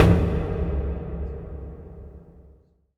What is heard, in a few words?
Kick Hit; Sonic Foundry (Sony Creative Software); The Electronic Music Manuscript Collection